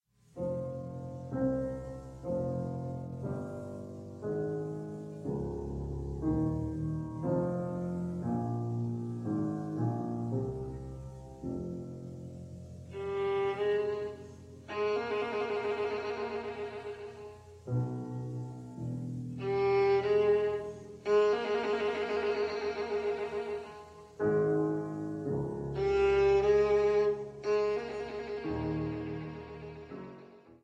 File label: Sonata in F minor